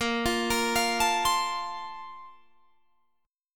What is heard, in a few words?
A sharp Major 7th Suspended 2nd, Guitar, Drop D